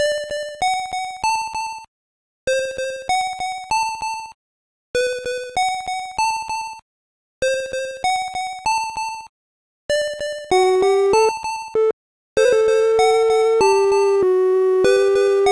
sigh of dog; Undertale

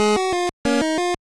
happy town; Undertale

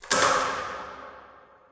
stick snap; Undertale

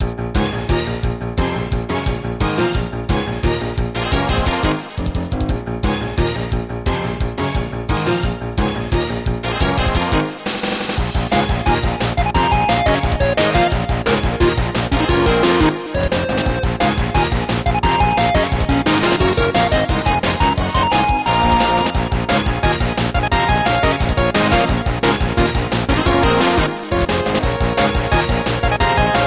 battle; Undertale

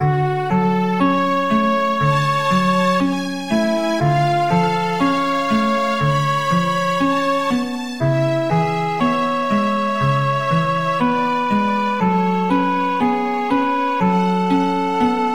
musical; Undertale

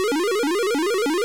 computer; Undertale

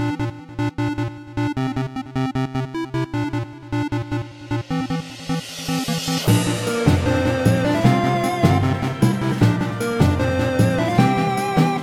birdsong; Undertale

